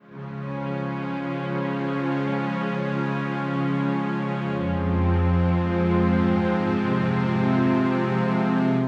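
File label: credits strings F minor 108; Cinematic Trailer Loops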